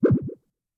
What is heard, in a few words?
pop up; 落下音・はねる音